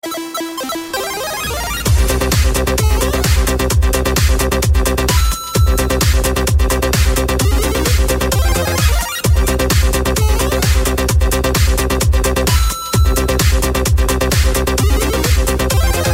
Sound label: Electro; Mario